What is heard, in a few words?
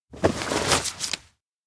box hand raise; anemoi